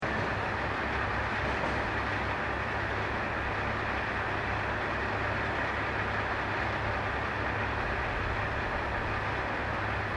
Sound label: Train Aomori DC40 Passenger Diesal Idle Distant Loop, Japanese Trains; Vehicles